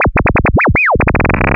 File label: LFO, Noise